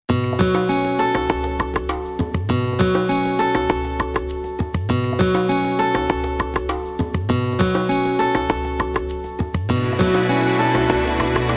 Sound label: ringtone